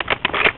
reload; zombieii

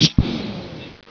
mine drop

